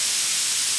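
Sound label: locomotive; loko; Mafie 1 cz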